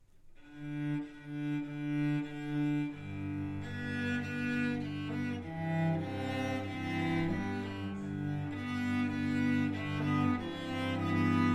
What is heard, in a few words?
Cello Choir Etude